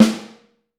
Vintage Snare Drum; Q Up Arts; Johnny.C's Vintage Snare Drum; 6.5X14

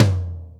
FTOM, Drumscores, KITS SMALL; 90s sample CD

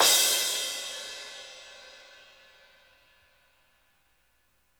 CRASH; Drum Kits

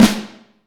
Vintage Snare Drum; Q Up Arts; Johnny.C's Vintage Snare Drum; 5.5X14

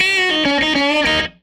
Strat Guitar Loop; Sonic Foundry; Six-String Orchestra

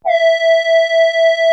SQUASAR E4, LEAD; Music Store - Claudius Diemeter Schwerlos

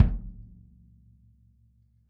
kick

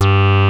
HITEC HI G2, ANALOG BASS; Music Store - Claudius Diemeter Schwerlos

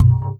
VOCO, WAVDRM HITS; Spectrasonics; Liquid Grooves

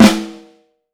FLAM, Snare Drum; Q Up Arts; Johnny.C's Vintage Snare Drum; 6.5X14

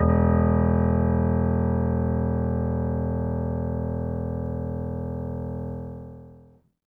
piano mono; InVision Interactive; Miroslav Vitous

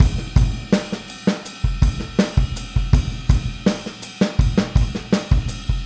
Boogaloo Chorus Full Mix, 82 BPM

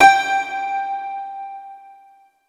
HARP G3 R, SYNTH PLUCK; Music Store - Claudius Diemeter Schwerlos

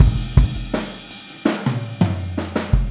Boogaloo Fill Kick, 82 BPM; Sonic Foundry; Drums from the Big Room The Mixes

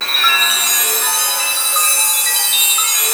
A#4 WINDY, BELLTASY; Northstar - The Wizard VOL-1

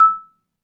MARIMBA F#6; Synclavier Percussion; ILIO; World & Orchestral

